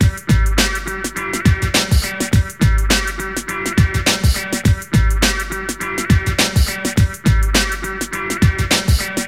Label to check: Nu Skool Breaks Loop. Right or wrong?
Right